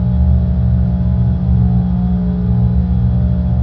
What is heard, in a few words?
indoor, ambient atmosphere